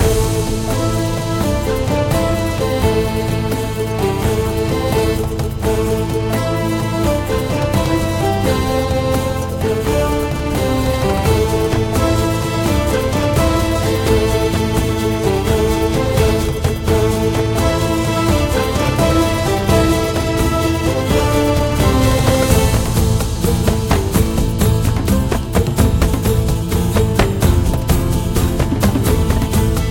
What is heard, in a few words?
avg chasing loop, music